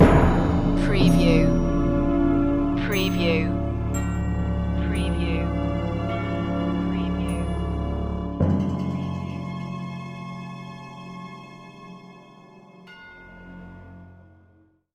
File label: orchestral suspense